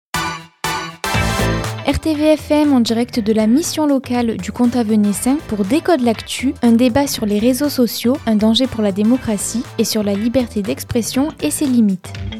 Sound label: Jingle; Decode l'actu